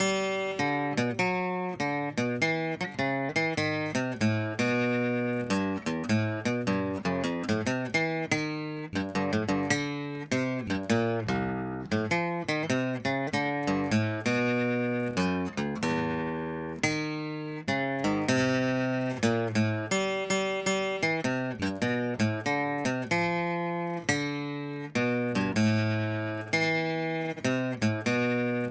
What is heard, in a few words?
guitar alice; Audio Analogies